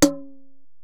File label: Ac Perc, Drum Machines Alesis DM-PRO